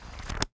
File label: Book Pages Flip and Close, Office Supplies